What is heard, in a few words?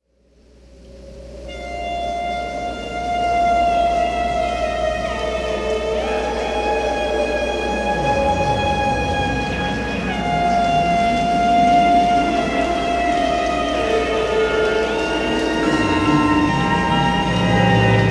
ghostly music; Halloween Nightmare CD Sound Effects